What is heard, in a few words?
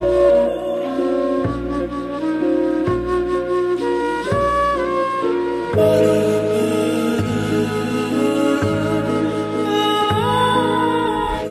flute, Jazzmatazz loops